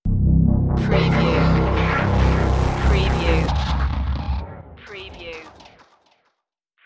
steampunk robot stinger

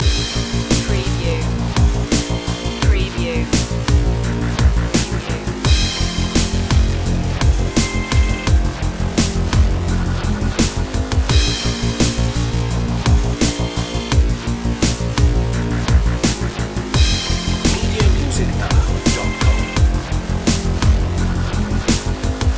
vital beats loop

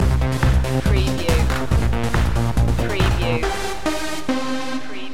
techno house promo loop

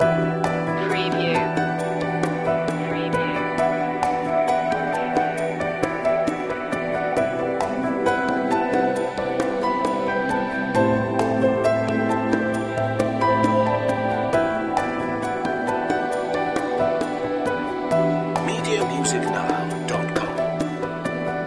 cafe vibes loop

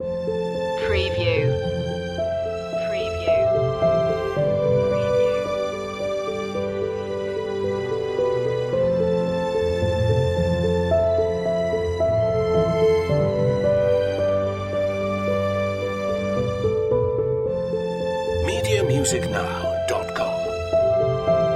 romantic hopeful

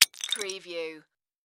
Ceramic Drop Break

